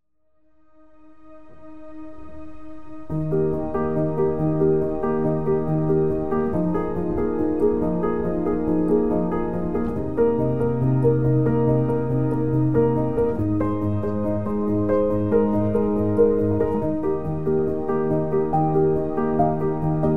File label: piano music